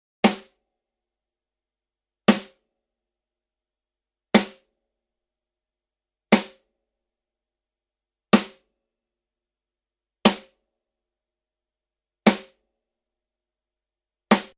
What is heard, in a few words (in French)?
caisse claire, aucun traiement sur ce son et c'est une prise un peu lointain; batterie